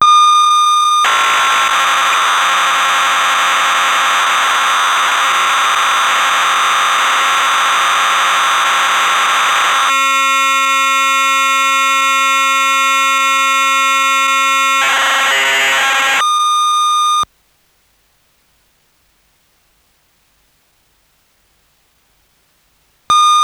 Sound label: Drumulator factory presets; EMU; Cassette Interface; Synthesizer Factory Patches